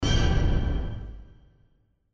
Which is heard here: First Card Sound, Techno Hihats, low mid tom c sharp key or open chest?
First Card Sound